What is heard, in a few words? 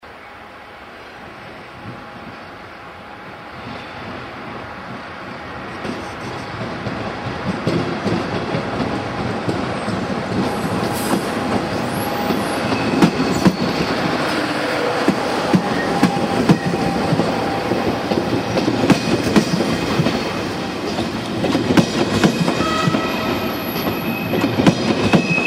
train